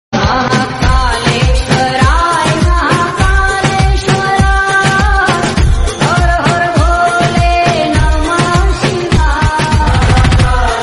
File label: devotional tamil